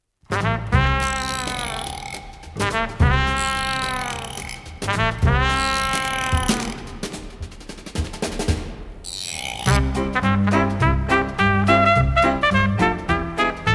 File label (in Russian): instrumentalnyiy ansambl